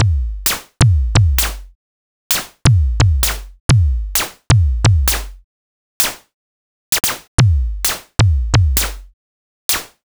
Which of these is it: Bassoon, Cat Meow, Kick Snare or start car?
Kick Snare